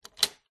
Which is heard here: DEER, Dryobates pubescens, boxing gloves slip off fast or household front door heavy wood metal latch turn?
household front door heavy wood metal latch turn